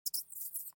rat agroed; STALKER; customnpcs

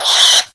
mtushkano pain; STALKER; customnpcs

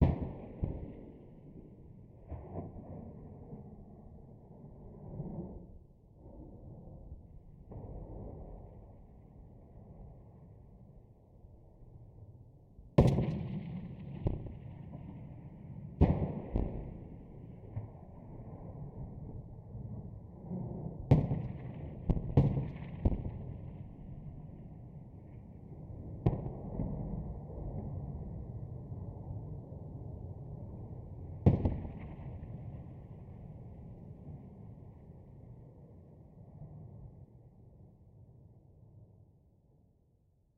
ambient shooting; STALKER

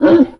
dog idle; STALKER

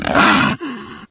mtushkano threaten; STALKER; customnpcs

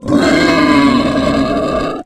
mboar death; STALKER; customnpcs

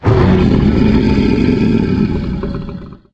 mgiant death; STALKER; customnpcs

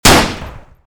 groza fire; STALKER

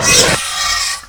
chimera death; mutants; STALKER; customnpcs

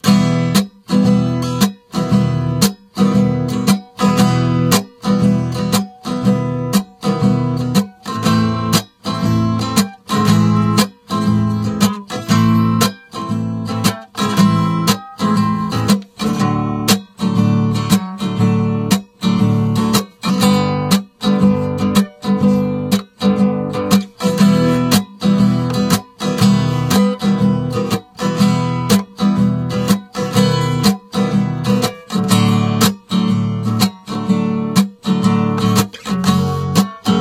guitar; STALKER

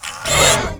chimera make hit; mutants; STALKER; customnpcs

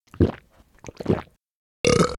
drinking; STALKER

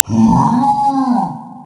karlik take hit; mutants; STALKER; customnpcs

